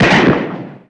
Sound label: the bullet blow shoot